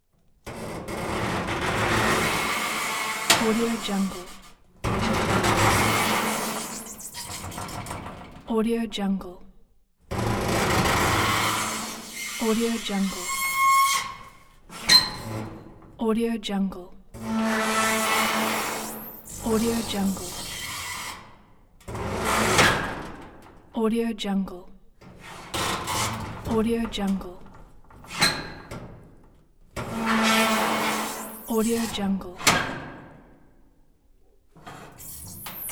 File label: squeaky metal door set